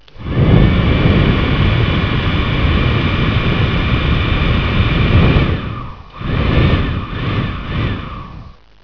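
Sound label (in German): Föhn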